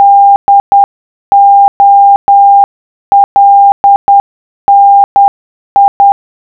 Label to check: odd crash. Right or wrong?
Wrong